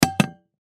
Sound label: Pot Set Down; Household; Pot Stainless Steel 3 Quarts Set Down Wood Surface; Pots and Pans